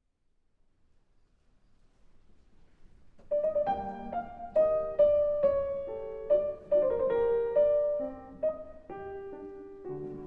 Keyboard Sonata in G Minor

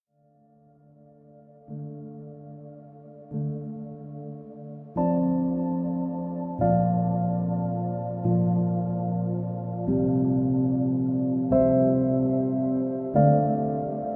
Piano Version

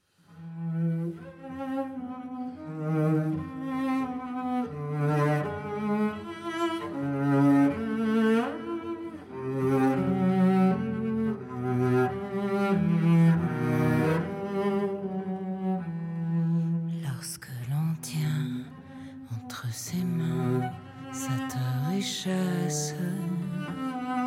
Cello

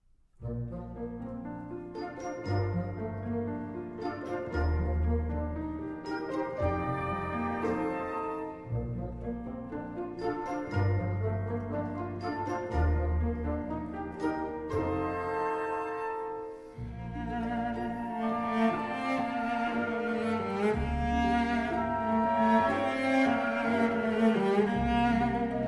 Cello and Orchestra

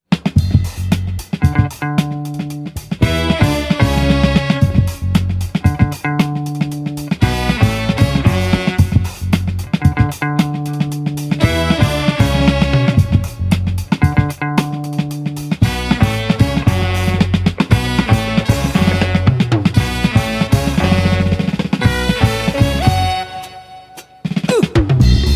Akpesse Funk

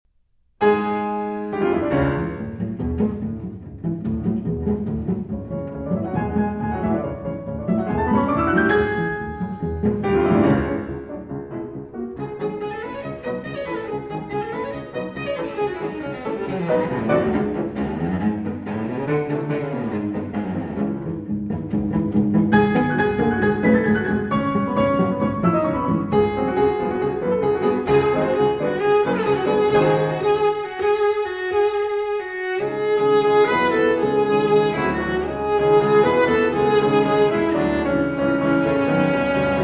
Cello and Piano, Vite et Nerveusement Rhythmé; 3 Pieces For Cello and Piano III.

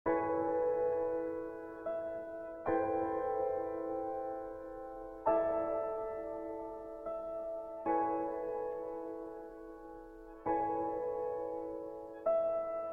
No Vocals

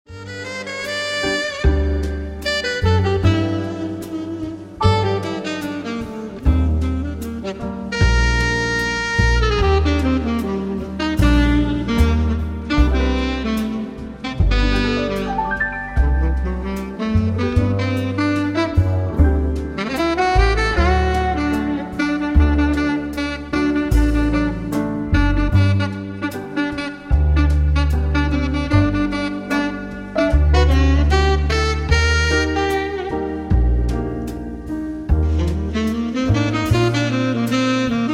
Soft Background Music

